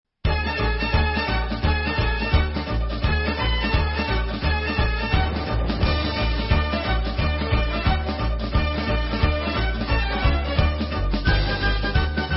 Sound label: Folk Instrumental; Perinizta